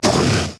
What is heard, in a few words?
stomper death big, enemies; Factorio